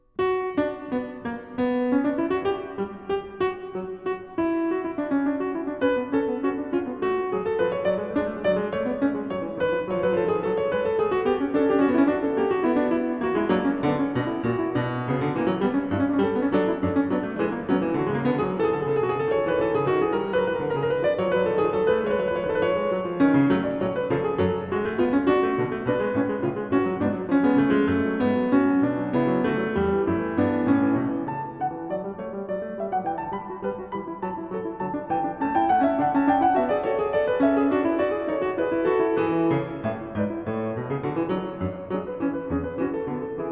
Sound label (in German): Fuge h-Moll; BWV 893; J.S. Bach; Das Wohltemperierte Klavier Book 2